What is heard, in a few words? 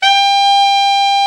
BRA TENOR, SAX; Best Service Gigapack 1; BR SAX 4